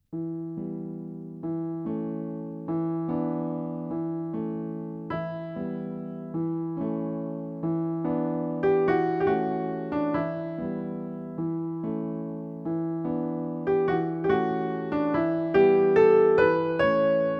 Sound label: MAZURKA